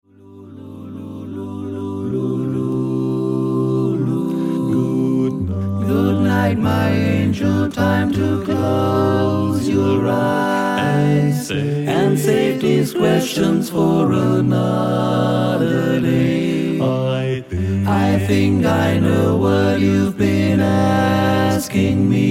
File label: Lullabye